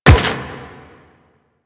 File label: Run Metal, footsteps